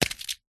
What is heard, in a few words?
foley wasp nest section hit ground